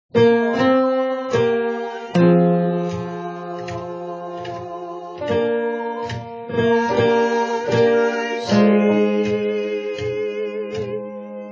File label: Bass